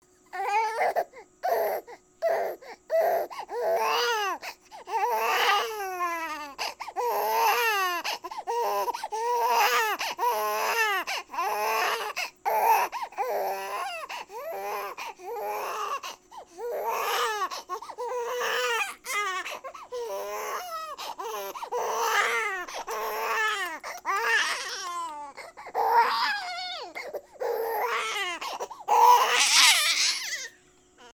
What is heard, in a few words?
Baby Cry